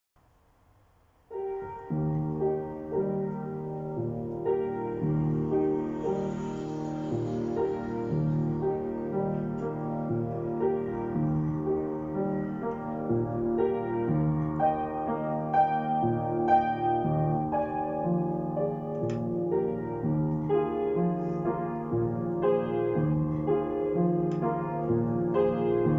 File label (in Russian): Брамс Колыбельная